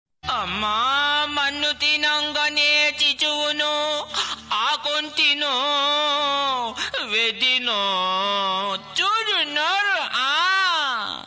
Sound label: Old Song Telugu Ringtone